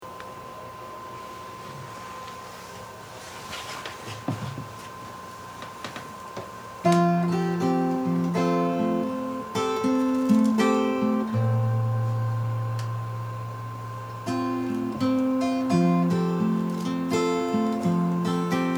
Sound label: guitar guitar; tonica